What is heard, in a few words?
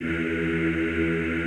EEH E1, Classical Choir; Best Service; Extended Classical Choir